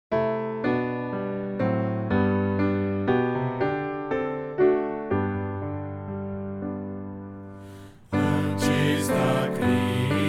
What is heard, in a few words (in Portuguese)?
voz piano